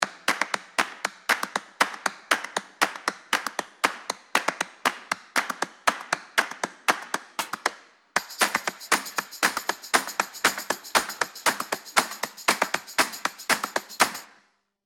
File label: music